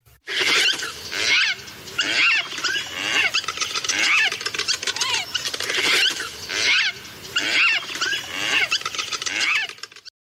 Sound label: marabou africa cri